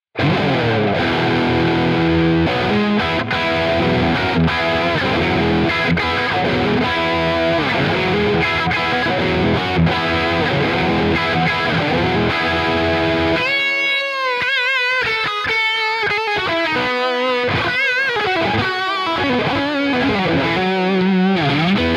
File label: Ibanez QX54QM Beefy Rhythm